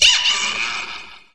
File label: graveyard shriek growl